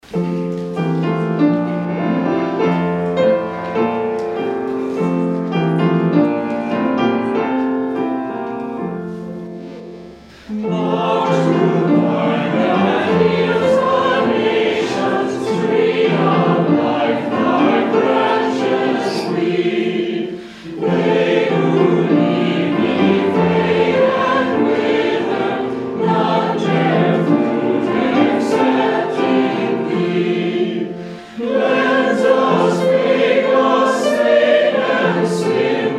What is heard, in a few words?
Hymn; Thou True Vine, That Heals